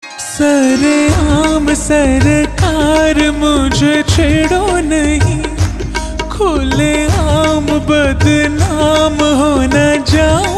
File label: slowed and reverb